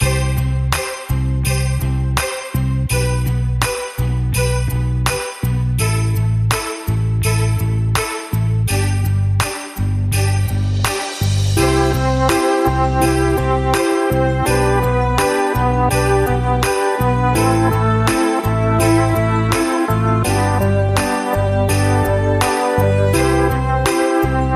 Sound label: No Melody